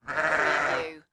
Sheep Bah; sound effects bible

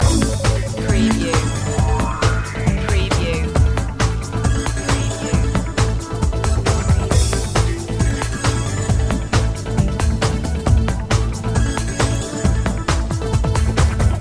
electricity loop

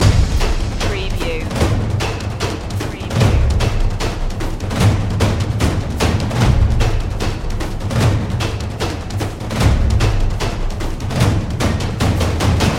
drums of destruction loop

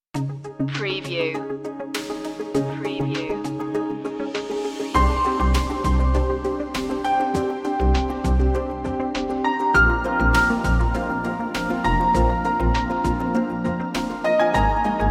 ad style

